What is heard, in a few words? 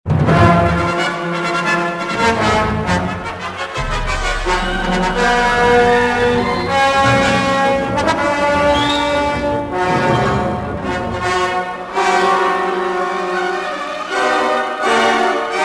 action, music; Star Wars Jedi Knight I Jedi Academy; XBOX; t2 wedge